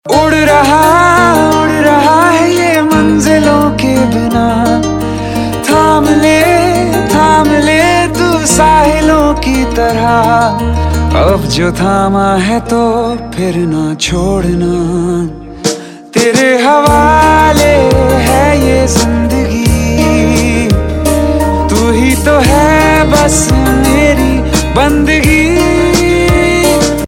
Ringtone

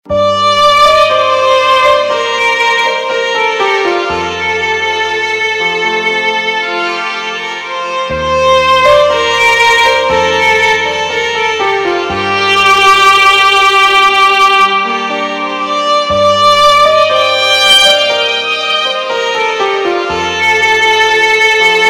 Instrumental Ringtone; Bollywood Hits (2014)

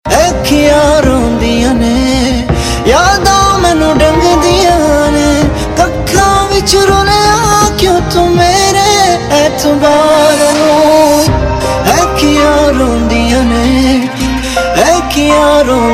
Unplugged Version Ringtone, Punjabi

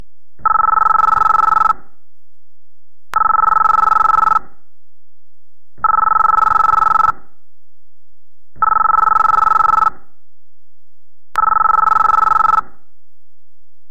Matrix Ringtone

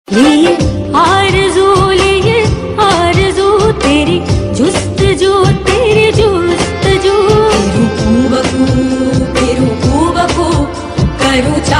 Female

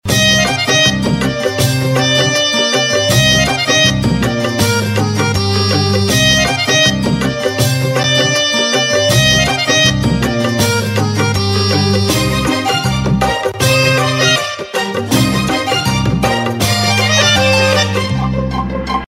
BG Tune; TV Serial Tone; Sony

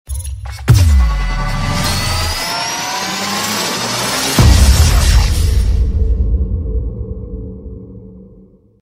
Machine On - SMS Tone

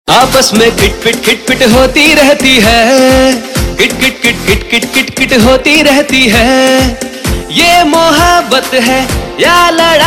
Tune, Bollywood Punjabi Pop; Veera - Ek Veer Ki Ardaas

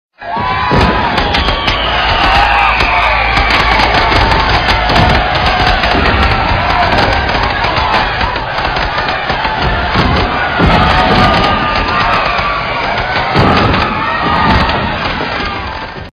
Diwali Fireworks

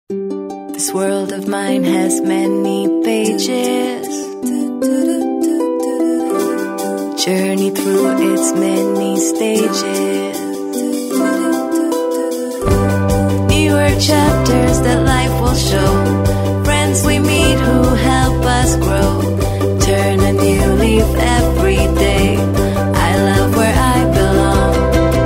TV ad English song; Jaipur National University

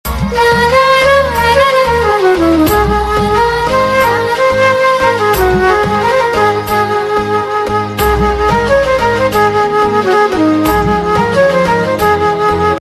Flute; TV Serial Tone; Star Plus

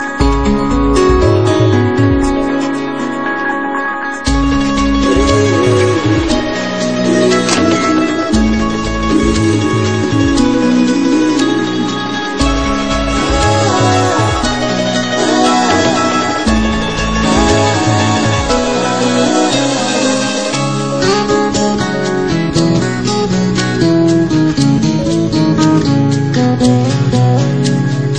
Guitar Beats; Star Plus

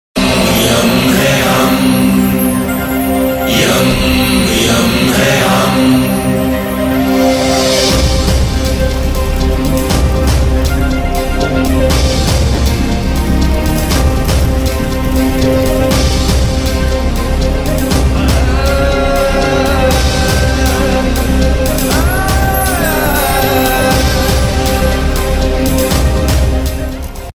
Title Tune; TV Serial Tone; SAB TV; Yam Hain Hum

